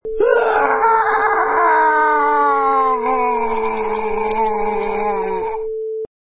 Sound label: Blood Curdling Scream